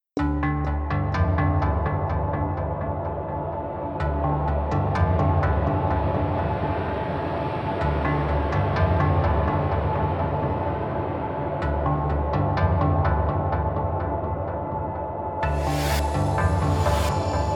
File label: Jungle Theme